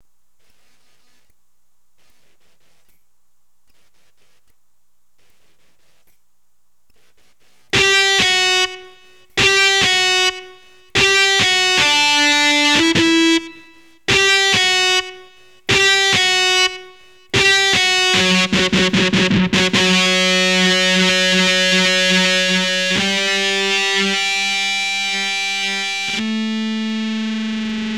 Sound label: e gTR Line